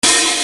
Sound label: LINN OP H, Linn 9000